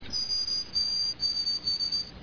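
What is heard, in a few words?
white throated sparrow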